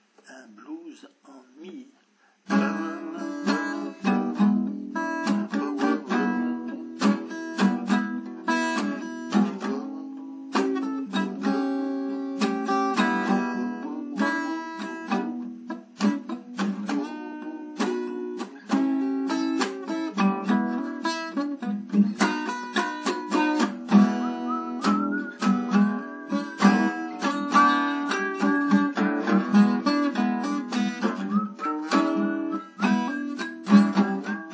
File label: blues folk; guitare